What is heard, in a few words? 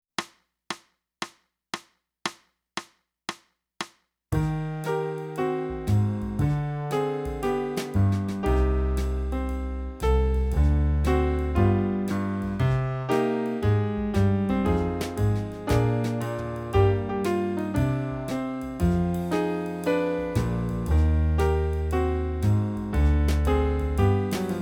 sax lullaby